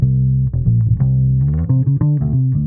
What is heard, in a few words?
Bass 4 beats 90bpm